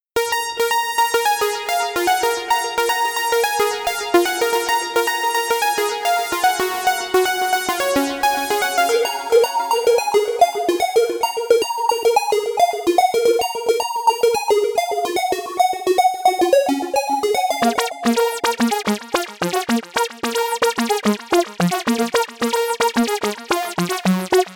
leads; wired

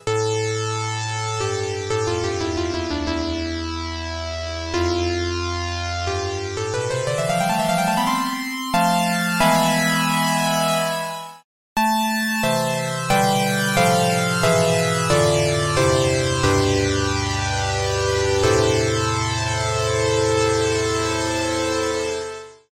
keys soft overdriven

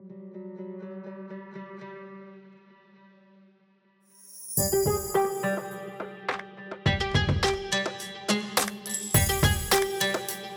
arabic oud; the oud song

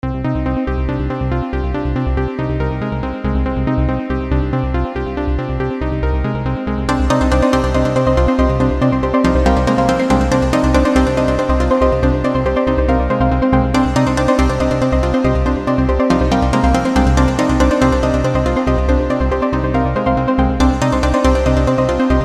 sawpluckz

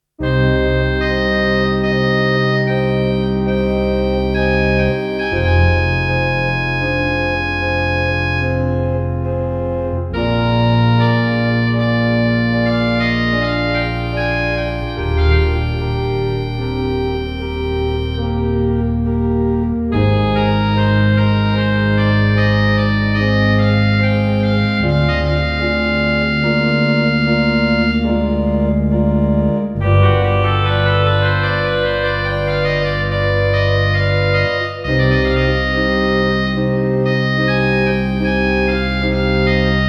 oboe concert c min

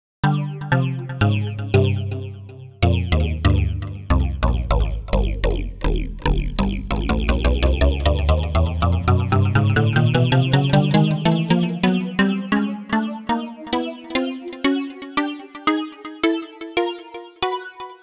seq pluck short vocal

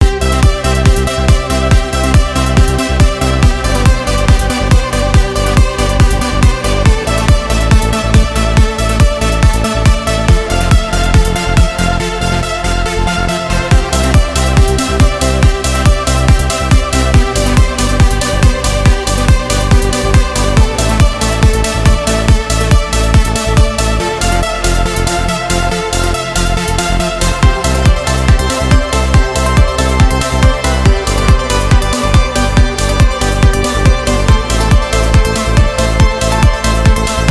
kick euro trance; kick factory 2

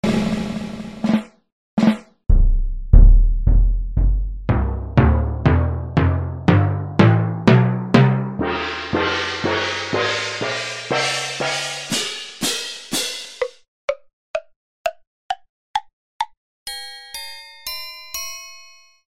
orchestral percussion set

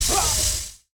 dark chemical spray fx

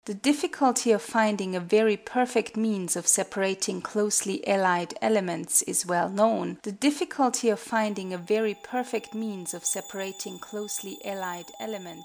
noisecoder

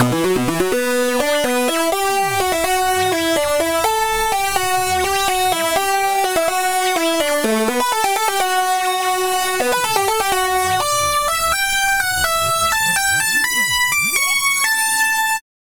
lead; ambigotry

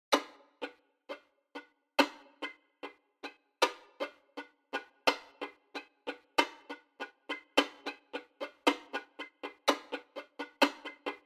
fiddle chops

